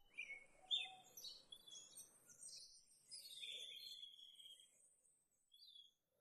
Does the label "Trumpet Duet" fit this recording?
No